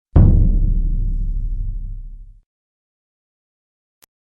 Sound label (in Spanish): Bombo Orquestal; honduras musical 7mo grado